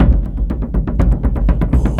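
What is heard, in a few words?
Music Shield; from dust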